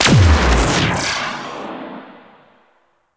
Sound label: Railjack Ship Power Flares; Warframe